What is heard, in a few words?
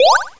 Power